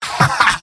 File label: laugh short; mvm